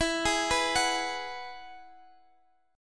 E Major Add 9th, Guitar, DADGAD; chord shape

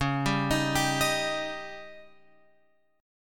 Db Suspended 2nd Flat 5th, Guitar, Open F; arpeggiated; JGuitar

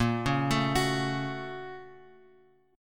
A sharp Minor Major 7th Sharp 5th A sharp; A#mM7#5 Chord {6 4 7 X 7 X} arpeggiated

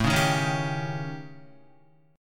A 7th Flat 5th, down, Guitar; A7b5 strummed; JGuitar

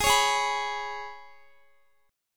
Ab Minor Double Flat 5th, Guitar; Abmbb5; strummed; chordshape

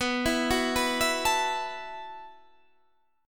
E Suspended 2nd Suspended 4th B

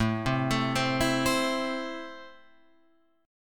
A Minor, Guitar, Open F; 9,7,9,7,7,7; JGuitar

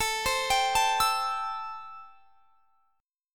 A-Minor 7th A; x,24,22,24,22,24; arpeggiated; Am7

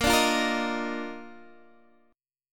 D sharp Major 7th Suspended 2nd A sharp

